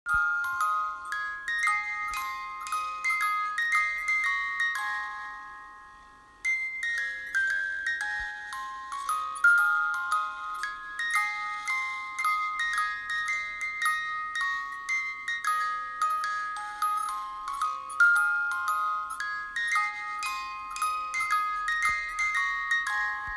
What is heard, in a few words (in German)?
Spieluhr Melodie; Turky in the straw